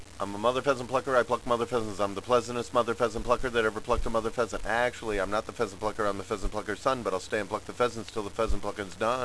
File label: pluck